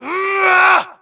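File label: effort heavy, voice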